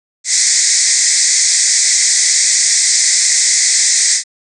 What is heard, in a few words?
Noise Prepalatal